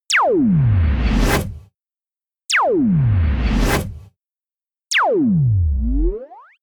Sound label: disintegrate